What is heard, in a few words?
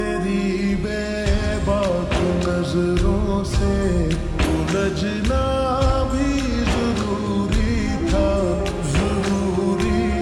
Ringtone Slowed Reverb